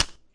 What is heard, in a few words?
stick ish, Cigarette Lighter Kit